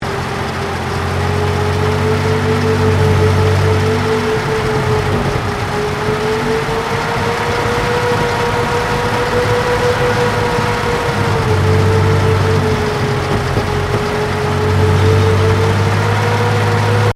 TANK CENTURION MANOUVER OFFLOAD, British Centurian main battle tank; Trucks (Sound Effects:Vehicles)